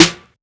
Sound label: snare garage; one shots; SEISMIC Drum Sample Pack; Slate